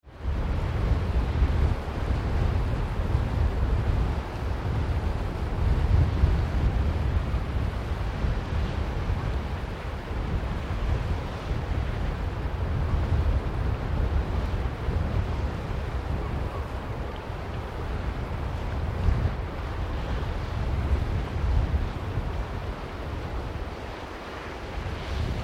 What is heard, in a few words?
Wind Strong with Sea Background; Weather